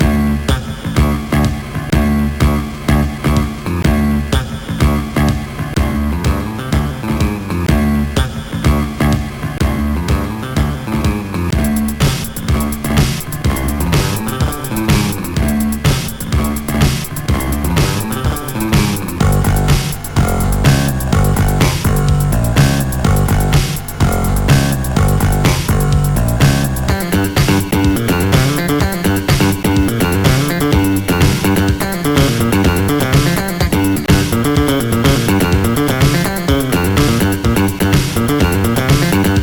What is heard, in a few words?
bass madness; Trackers; Modland; Melf